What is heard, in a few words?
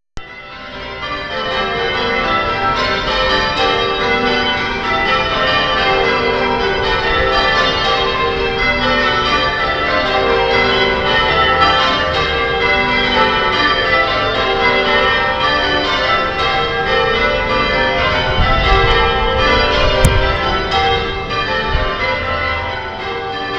bells